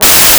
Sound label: BARITON; Best Service; Alpine Volksmusik